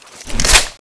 pm9 clip in, weapons

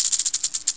rattle, headcrab poison; hl2

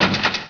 metal door locked

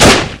30cal

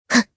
jump

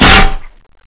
spade hit

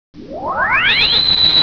turret begin

